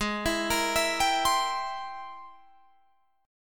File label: G sharp Augmented Major 9th G sharp Guitar Drop D